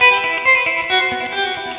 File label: 134 floatation guitar; GUITAR LOOPS